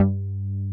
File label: JX JACK G3, JACK BASS; Drums In Your FACE!